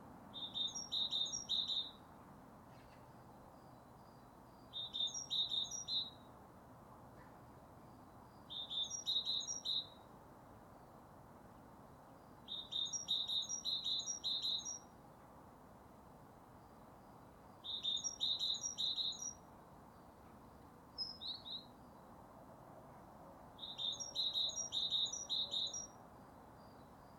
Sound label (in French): mésange charbonnière chant